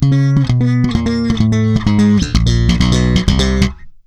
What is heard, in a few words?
FILL, C, 130 BPM, Bass; Sampleheads; Ultra Freakin' Bass